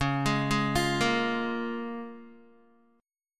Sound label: C sharp 6th C sharp down Guitar; C#6; arpeggiated; tuning=4F,4A,5C,5G,5C,6E